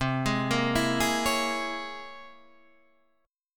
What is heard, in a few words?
C 7th Sharp 5th Flat 9th C